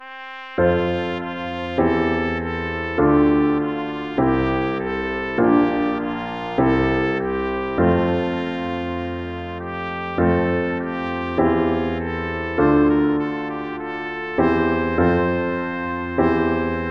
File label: all hail the power trumpet